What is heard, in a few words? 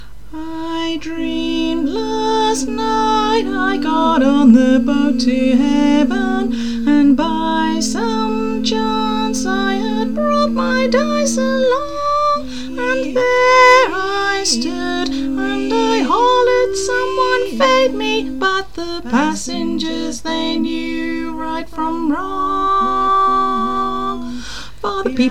SOPRANO, Choir; church